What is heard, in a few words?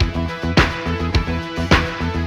full, Loops Phonky